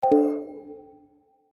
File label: mute sound; discord